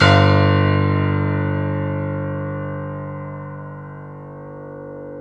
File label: PNO XYPNO, Grand Pno, Roland; Club-50 - Foundations; Grand Pno 1